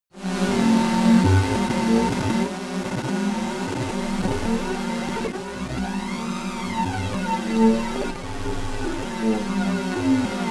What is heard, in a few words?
disturbing phaser; flaechen